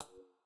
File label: Dialogue Ready; Game rip; MiSide